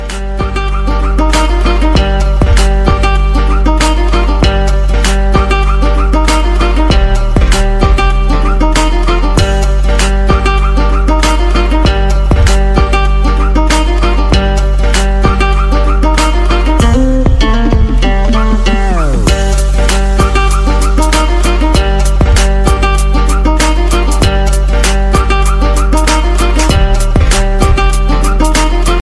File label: english remix ringtone